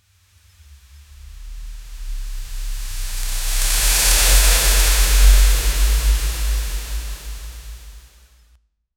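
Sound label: jhadu marne ka sound, broom sweeping routine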